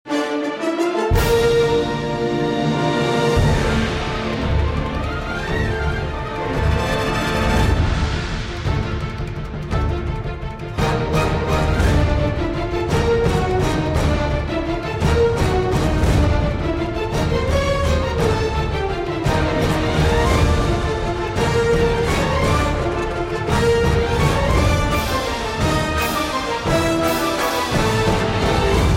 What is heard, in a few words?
bgm boss; dragon nest